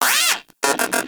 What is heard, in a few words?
Moa Aggressive Vocal Player Down; Warframe; Characters; Pets